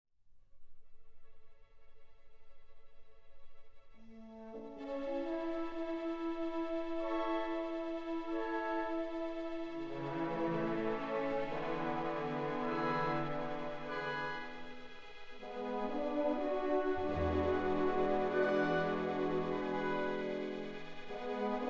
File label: Sync Wavetable Soni